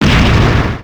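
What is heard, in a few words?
Explosion Short Loud Echo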